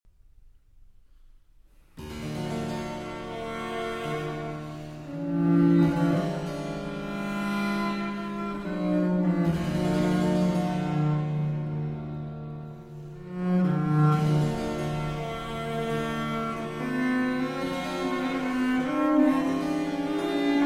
Violin Sonatas, Sonata in E Minor, Récit - Gai; 12 Violin Sonatas; No. 4 III.

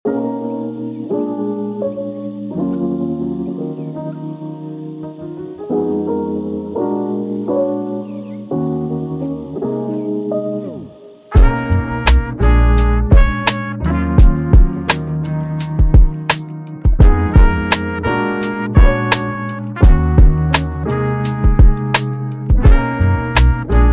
Lofi Trumpet

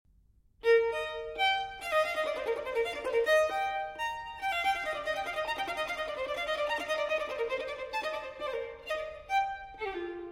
Solo Violin in E Major Spirituoso; TWV 4021; Telemann Fantasia

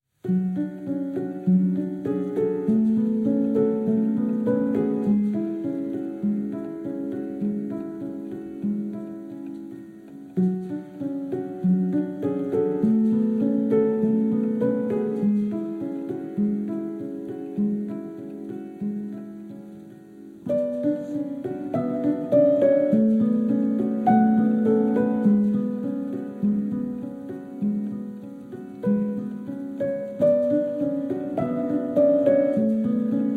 Inward Road (Piano Version)

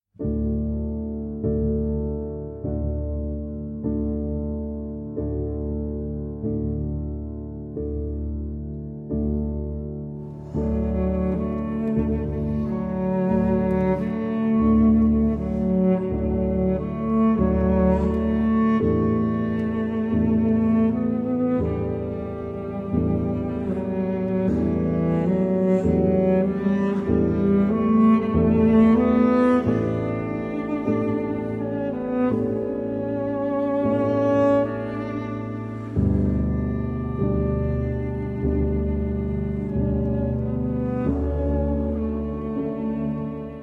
Piano; In Trutina; From Carmina Burana, Arr. fFor Piano and Cello

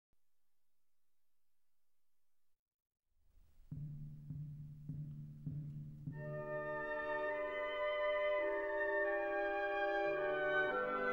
Violin Concerto in D Major; Op. 61; Beethoven

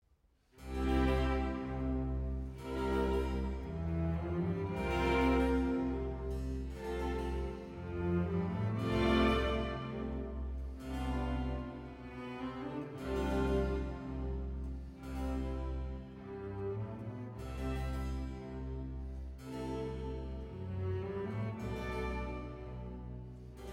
Violin Concerto in A Minor; No. 1; BWV 1041; J.S. Bach

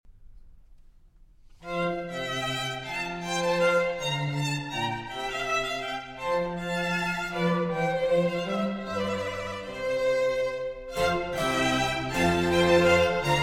Concerto No. 7 in F Major for 4 Violins

